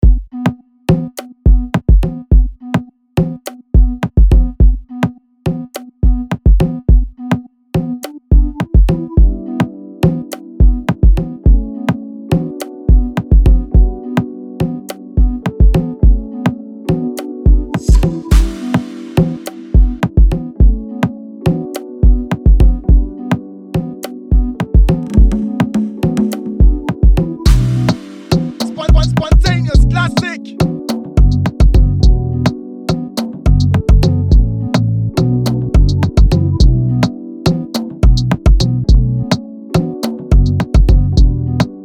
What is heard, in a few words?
105 bpm B min